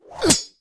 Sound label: swordman attack; propack